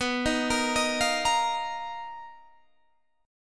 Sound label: B Major 7th Flat 5th B, Guitar, Half Step Down